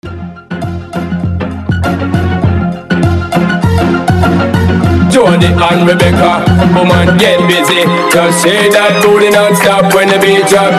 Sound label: Transition Wordplay Segue 100 107 BPM